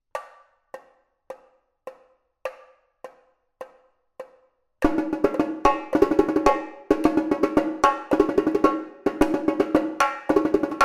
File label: Repinique, marcha